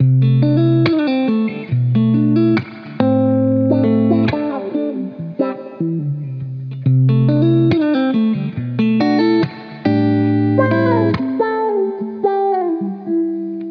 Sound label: guitar, Loops; Phonky